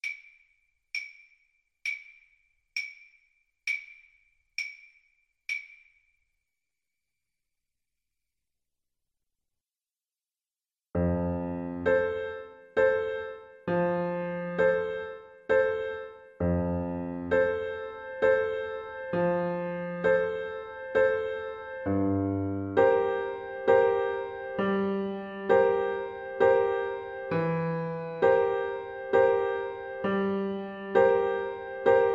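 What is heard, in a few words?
karaoke, Viola; Slow Playalong; Strauss II,Johann - Blue Danube Waltz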